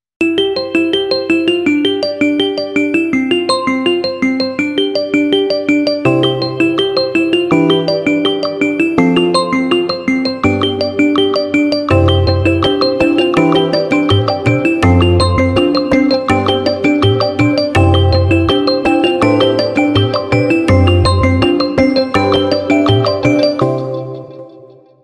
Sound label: Mi Phone Ringtone; ringtones